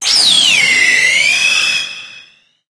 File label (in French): Cri Méga